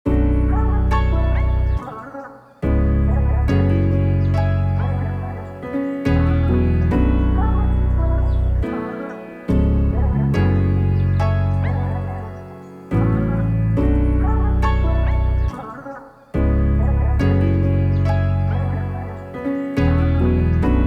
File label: F Min; Cymatics; Octane MIDI